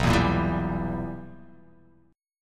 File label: B Major 7th Suspended 4th Sharp 5th, chord